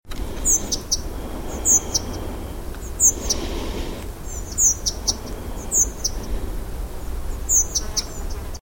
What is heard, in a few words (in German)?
grauschnaepper